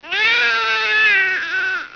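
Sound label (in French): Pleurs Bébé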